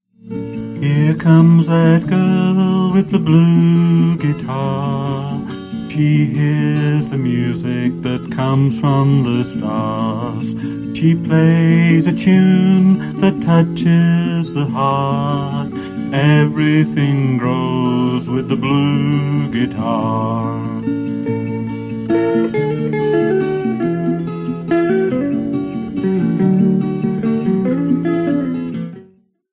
blue guitar

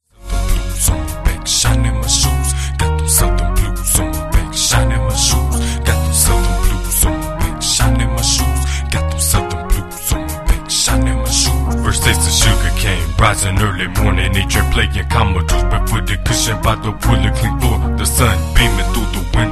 southern blues

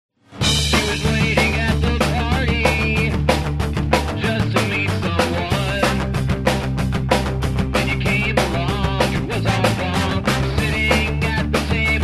bells strings

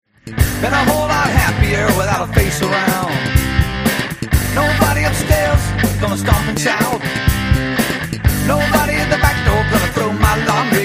play along drums classic rock

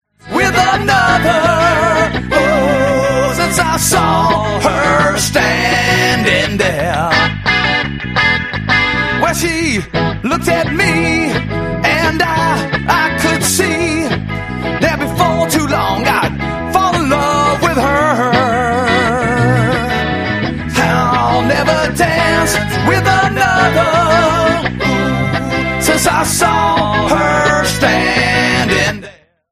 play along drums classic rock minus drums

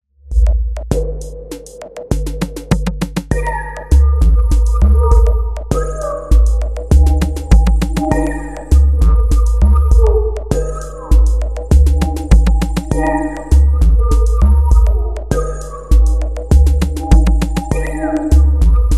instrumental

